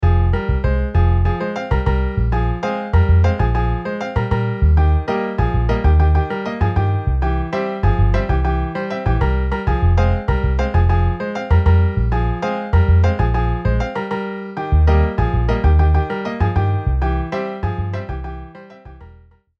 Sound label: Piano Bass MIDI